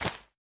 藤蔓破坏声

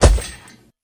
劫掠兽脚步声

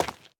滴水石踩踏声

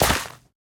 可疑的沙砾踩踏声

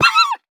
狐狸死亡声

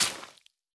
湿海绵 破坏声

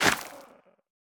灵魂土破坏声

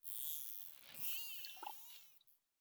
海豚水中待机声

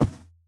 骆驼脚步声

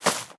藤蔓攀爬声

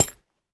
锁链踩踏声

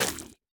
根破坏声

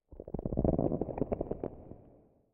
下界荒地地面声; ambient

